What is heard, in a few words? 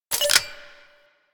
Pistol Clip Empty Mech C; Bard